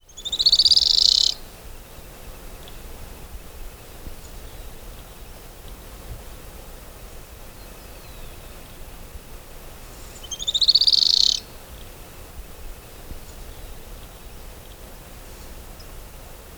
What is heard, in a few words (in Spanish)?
Canastero rayado Asthenes wyatti sanctaemartae